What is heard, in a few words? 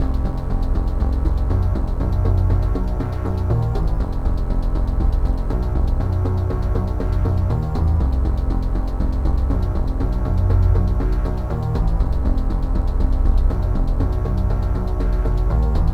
Bassline Poly